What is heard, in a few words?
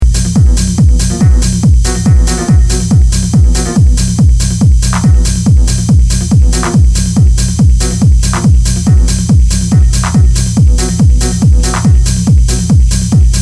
Ghostly Siren; BCCO